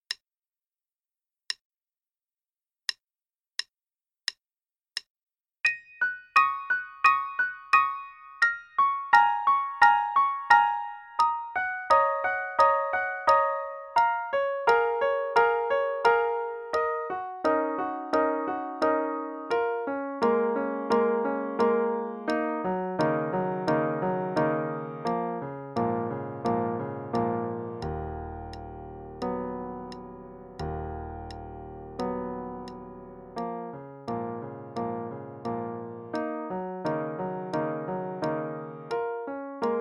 Improvisation, qn=88; Primer Level; Into the Cave Improvisation; Faber Improv

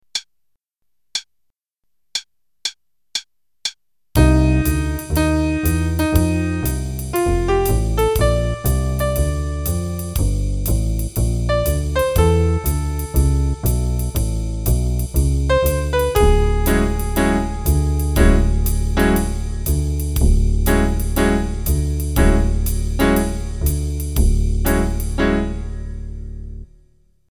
Play-a-long, ending qn=120, jazz piano

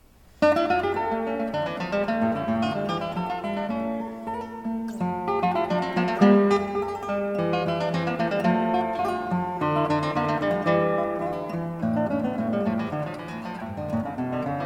Sonata in D minor, Guitar; Scarlatti

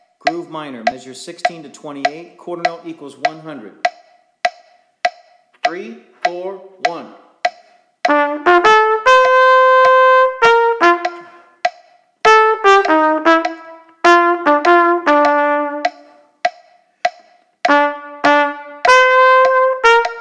Play-along, Groove Minor, qn=100, trumpet

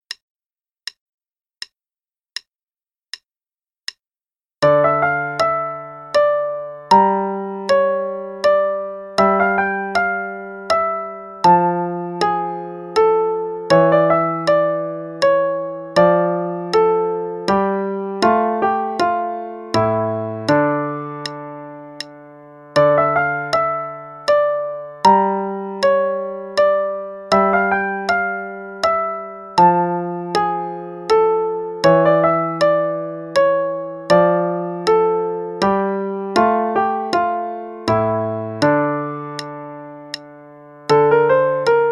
Play-along Sonata in D Minor, qn=80; Scarlatti

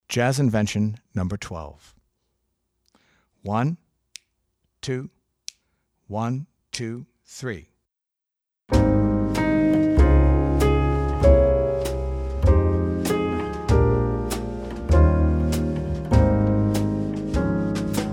Jazz Invention No. 2

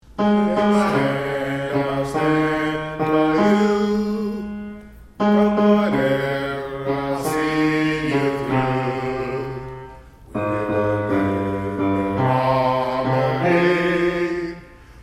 bass

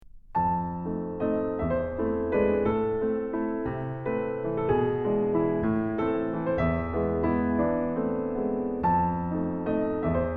Waltz; Magrath Book 08

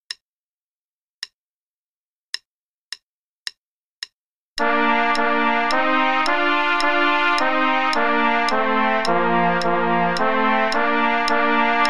Play-a-long, both parts, qn=108; Ode To Joy; trumpet

